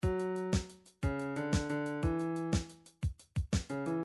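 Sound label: drums shuffle, midi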